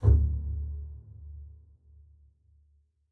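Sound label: music double bass stroke; The Curse of Nordic Cove; MusLevel1 DoubleBassStroke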